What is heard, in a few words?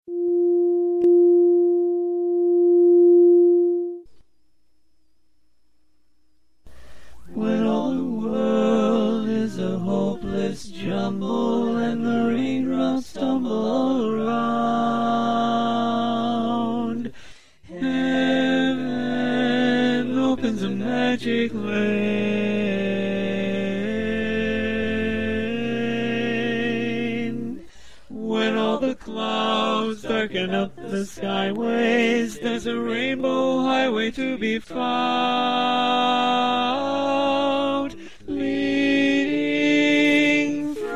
Rainbow in F Bari